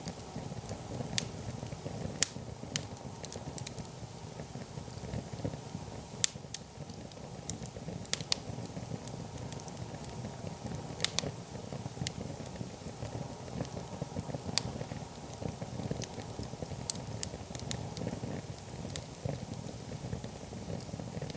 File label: cosy fire